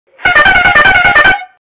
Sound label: airhorn